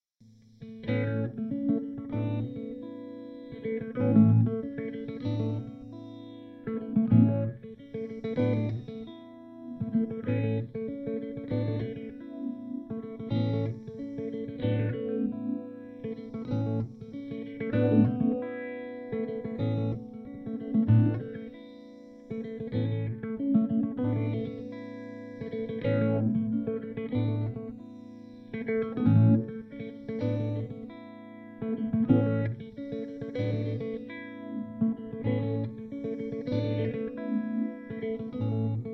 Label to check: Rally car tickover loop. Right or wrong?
Wrong